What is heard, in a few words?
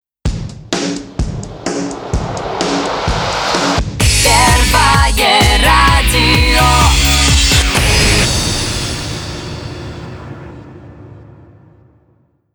radiojingle